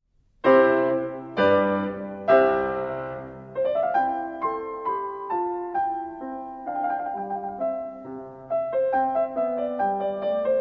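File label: Haydn sonate piano divertimento C major; muziek voor herdenking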